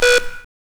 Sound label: BLEEP; X-Static Goldmine 1